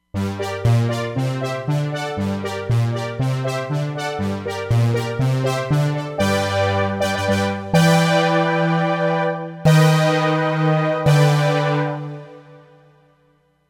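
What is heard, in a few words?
Detune Saw Brass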